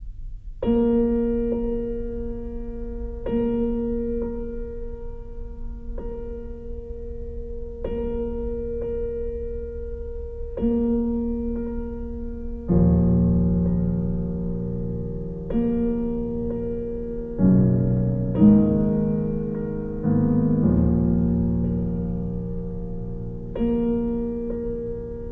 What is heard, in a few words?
piano; Gaspard De La Nuit; Le Gibet